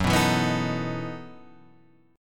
E Major 7th Suspended 2nd Suspended 4th

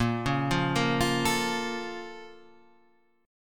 Bb Minor Major 7th, Guitar, Standard, down; BbmM7; arpeggiated; JGuitar

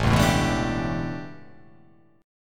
A 11th down, guitar chordshape; strummed

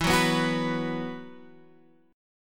E Suspended 2nd E, Esus2 chord; x,7,4,4,7,7; strummed; JGuitar

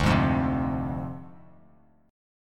D Minor 7th Flat 5th Bass Standard; Dm7b5; strummed

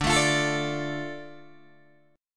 D Minor D Guitar DADGAD

